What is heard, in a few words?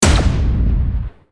laser; Starsiege; PC Gamer IT CD 35